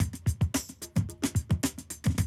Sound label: drum loop, 100 BPM; Best Service; XXL 1500 DrumLoops; Sample CDs